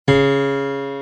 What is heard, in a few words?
2 C#, piano